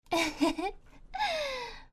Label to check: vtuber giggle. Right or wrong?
Right